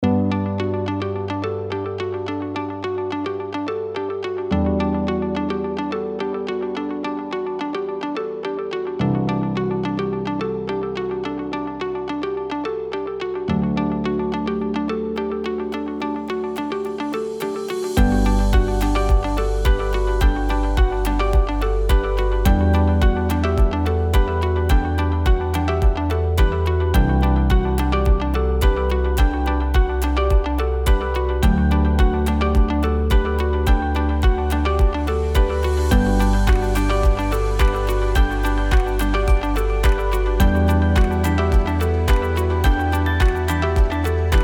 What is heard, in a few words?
easy corporate background